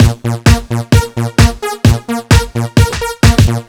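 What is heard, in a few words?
Bass drum Synth, 130bpm, Nu Dance Electro; Zero-G; Soundclash; Combi Loops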